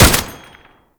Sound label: Tank Turret Shot; Enemies; Weapons; Warframe; Lasrian; LasrianTank